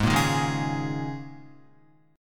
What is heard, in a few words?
G sharp Suspended 2nd Suspended 4th, G sharp, down, Guitar; G#sus2sus4; strummed; JGuitar